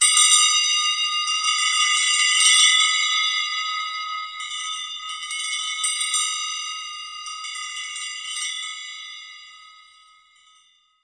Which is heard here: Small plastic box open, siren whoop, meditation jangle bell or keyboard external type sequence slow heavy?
meditation jangle bell